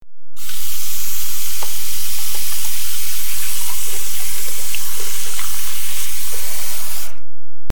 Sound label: Wash Hands